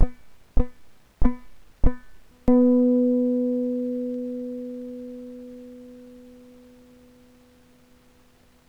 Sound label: Plucks 4 controlled 1 uncontrolled; Active Damping